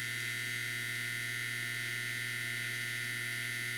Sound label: appliance medium loop; Ambience; Props; Warframe; TheNewWar; Zariman